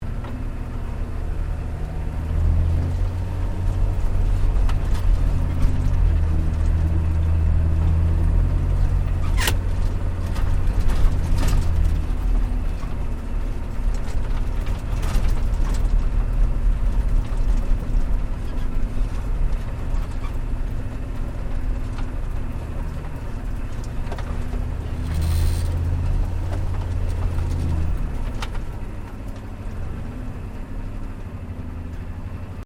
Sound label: 4WD HUMVEE MANOUVER INTERIOR, US Airforce model Humvee; Truck; Vehicles